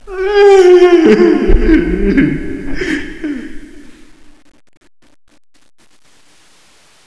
bone dude sad

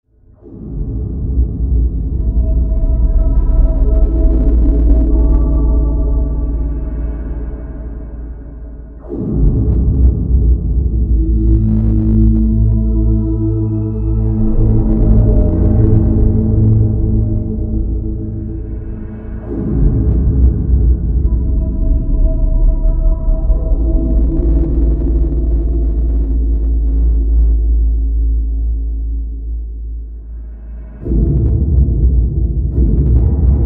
music

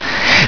die; gman

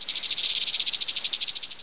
snake idle

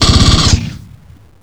fire; avp10 guns; sentry

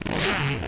spark

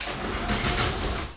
train move

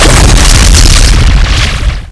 bfg fire, weapons; sunofgod

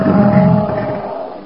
die, zgrunt

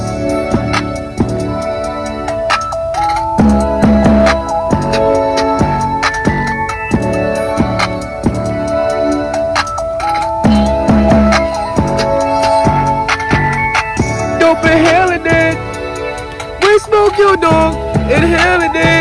inhale

